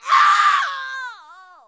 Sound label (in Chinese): 女人惨叫; renlei